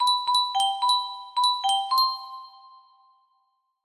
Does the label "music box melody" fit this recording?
Yes